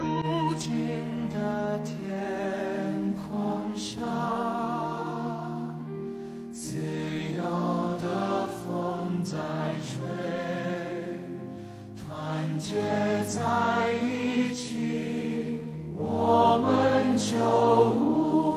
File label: anthem